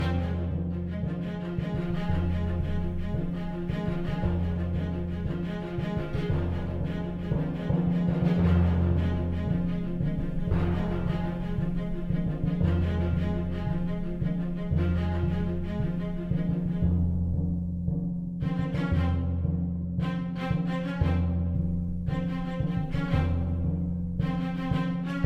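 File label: volcano enemy fight; beast quest; ps4; Stonewin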